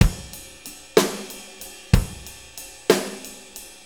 6-8 Loop, Full Kit Loops

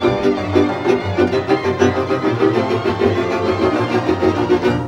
VIOLA BREAKS; Best Service; World Colours

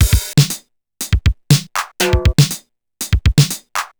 TR 505 (120BPM), beats; musicradar; cyborg beats

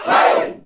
GROUP HIE, SHOUT, Heart of Africa; Spectrasonics; Heart of Africa 1; CH. SHOUT1

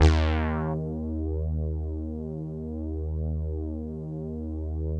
Deep Bass d#2, Korg EX-800

